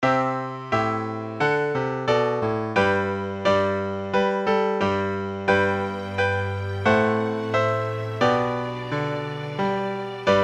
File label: Rigadoon 88 BPM; Primo Performance